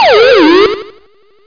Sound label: CLANGER